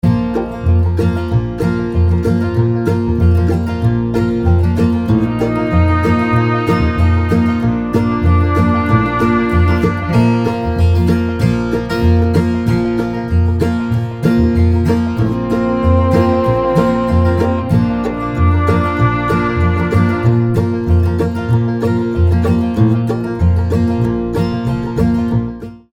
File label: scale degrees major duples bluegrass; melody